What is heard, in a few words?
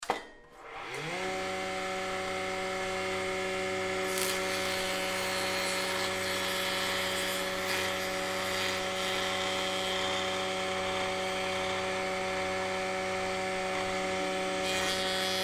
Power Tool Start Run Shut Off Grinder Table; Industry; Blastwave